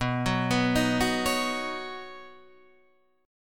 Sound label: B Major Add 9th B Guitar Open D